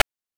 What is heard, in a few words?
PITCHED NOISE; NES DPCM; MIDINES